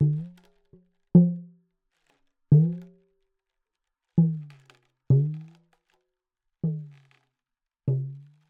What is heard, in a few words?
Talking Drum Many Hits; Percussion Samples